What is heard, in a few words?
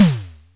TOM, Simmons SD-8 Drums